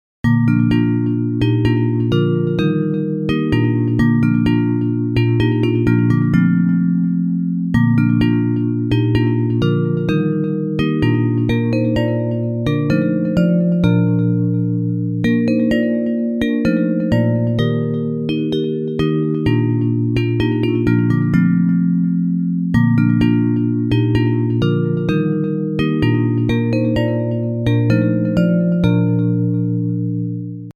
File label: Bells